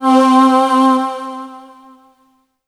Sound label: Yamaha TG100 Choir Pd C4